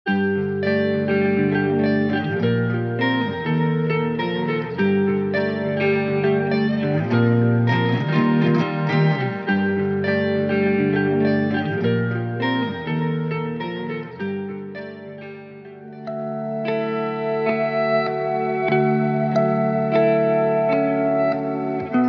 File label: loop kit; common drift; noria